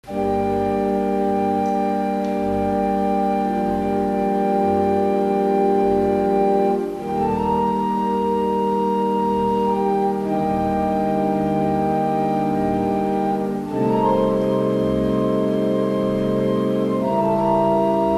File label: Adagio in G Minor; Albinoni